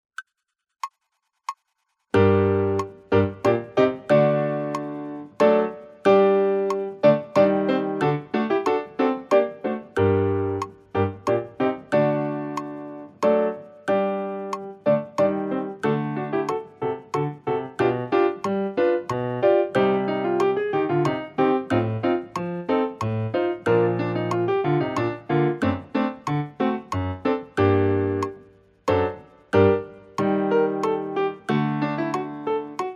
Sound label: Eccles Sonata Mvt 2 ACCOMP